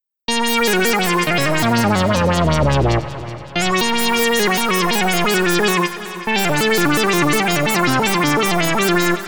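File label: sequence deep space; the transmitter soundset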